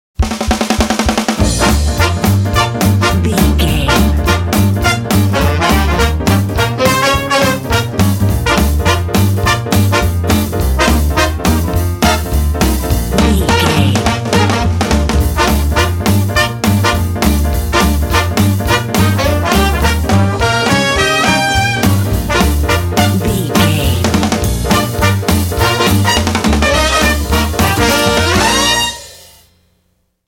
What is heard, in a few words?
jump sock hop dry